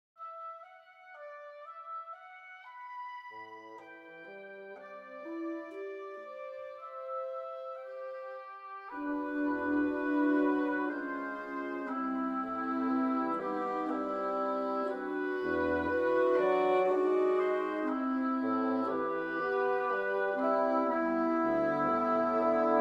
Prelude, orchestration; Prelude (1913); Ravel orchestration; never before orchestrated prelude by Ravel

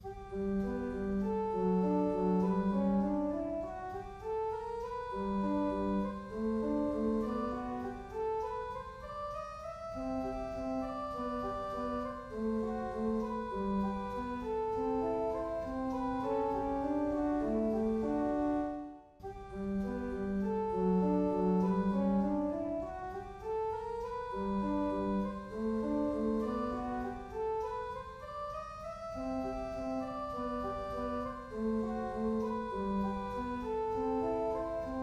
Organ Works; n.1 GM (do-re-mi)

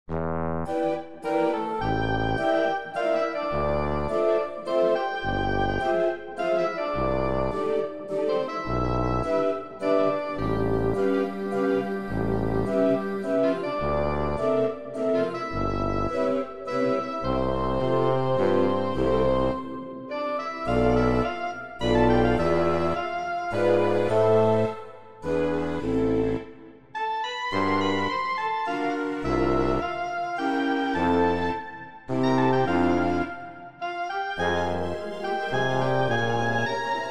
Waltz; Potato Chip Waltz

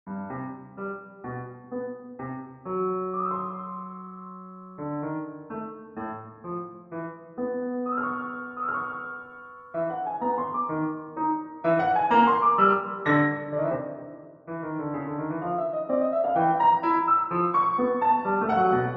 Suite for Piano, Seizoenen II. Lente

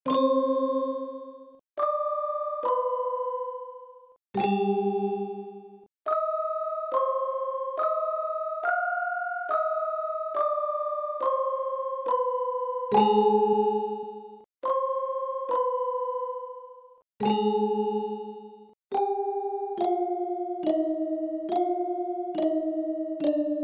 horror

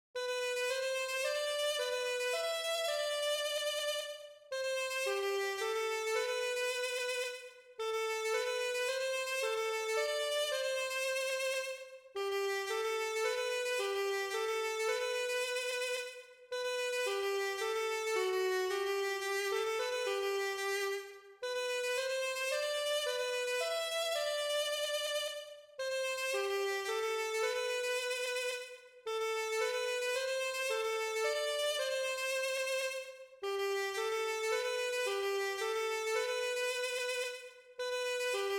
Soliloquy for Duduk

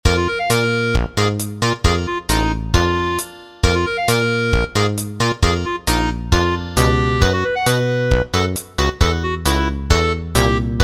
A rock

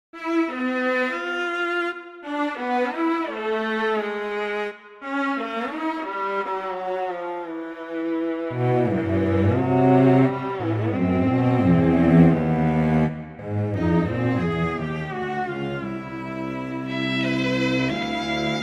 Microtonal Fugue; in A

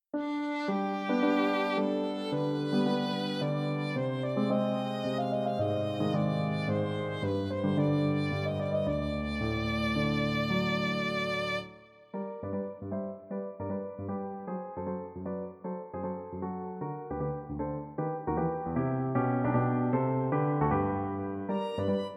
Mazurka Vivace; Daydream No.3

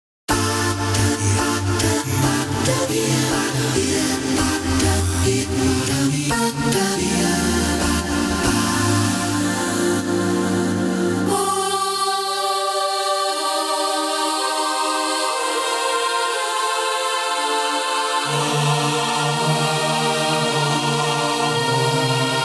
KEYBOARD VOCALS; 20 YEAR OLD; cantai the singing library

